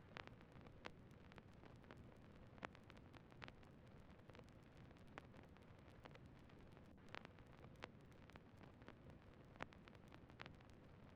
Lofi Melody Loop - 86 BPM C Min Noise; Melodics; Loops; Loop Stems; Cymatics; Eternity Sample Pack

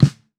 Snare Lo-Fi; Drums; Ghosthack - Free Snares 2023

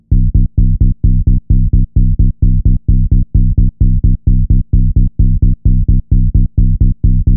Bass Loop 130 C, Techno; Ghosthack; Free Techno Sounds 2024

